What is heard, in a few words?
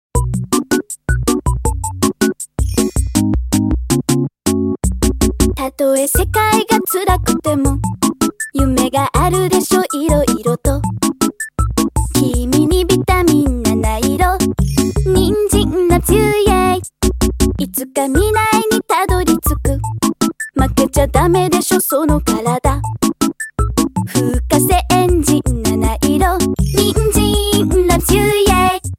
cute japan ringtone